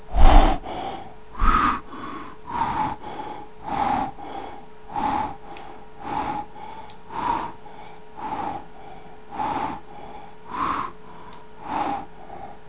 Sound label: breathing; Halloween